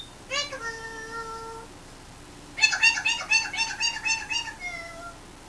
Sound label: peekaboo